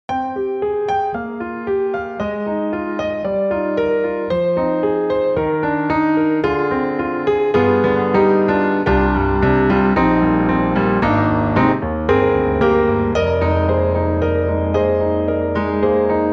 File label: Grand Rhapsody Piano, Warm Intimacy; Waves